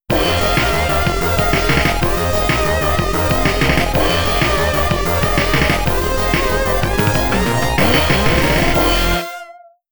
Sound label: ROUND CLEAR; TEA BREAK (ROUND CLEAR 5)